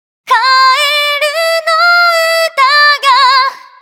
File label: power